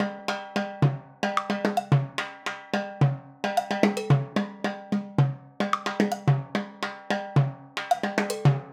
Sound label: timbales loop 110